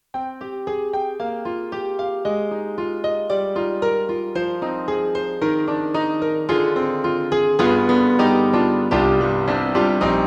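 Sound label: YAMAHA MU1000EX Double Concert Grand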